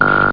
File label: Blip